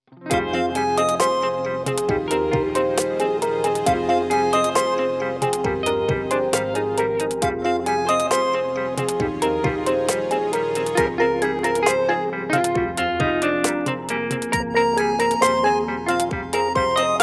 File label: ringtones; StepN; Vestel; Venus